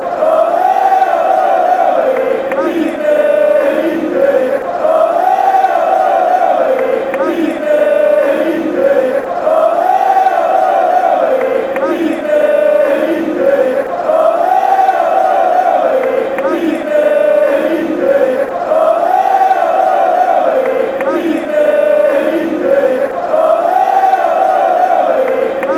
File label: ole ole ole, fanchants